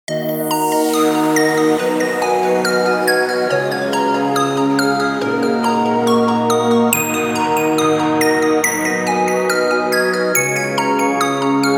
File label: melody